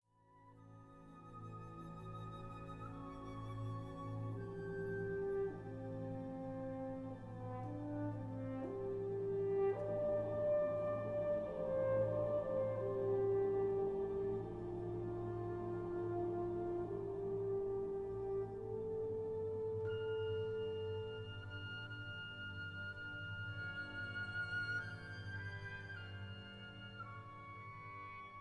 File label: horn theme; daphnis